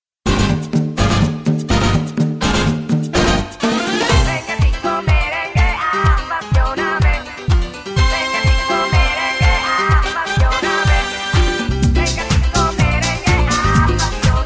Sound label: merengue de havana